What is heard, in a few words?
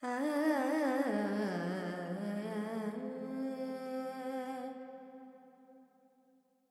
Carnatic Female Alaps, Purvi Kalyani, 100 G, Verb; Sample Banks